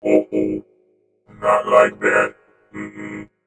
UH OH NO, Vocoder Vox; E-MU Producer Series Vol. 8 – Platinum Phatt

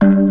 G1 FAST, DISTRT.B3; Northstar - New Gold VOL-1

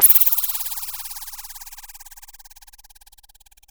Sci fi

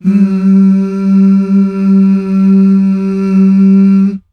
MMHH

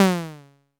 U220 FX Set, Drum Machines; Roland U220

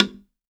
log drum; AKAI S-Series CD-ROM Sound Library VOL-5

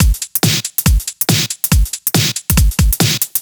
Break Beats, 140 BPM

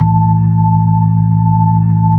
B-3 Chorus

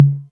tom mid, Novation Drumstation

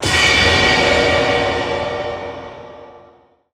movie, impacts & hits; Techno Trance Essentials

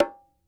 Bongo Low Med, World Percussion One Shots; Sony CS

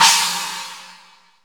drum, g1, YAMAHA R5 RX7, DRUMCOMPUTER; Retro Sampler

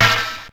Open Hat, Ensoniq ASR-X Pro

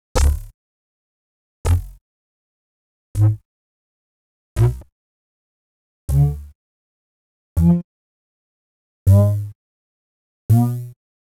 CS80 Perc Bass D+3; Klaus Schulze Collection

